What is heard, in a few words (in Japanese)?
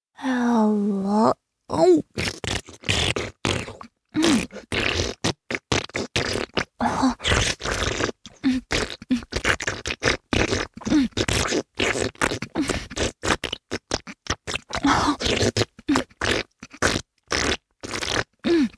フェラチオ(強):頭を前後に激しく振って陰茎を深くしゃぶる, チュパ音, ASMR; チュパ音抜粋集